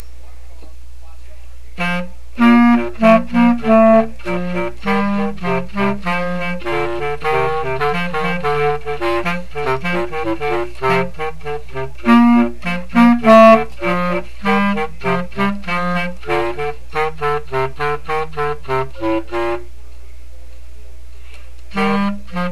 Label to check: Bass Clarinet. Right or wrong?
Right